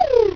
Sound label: pyun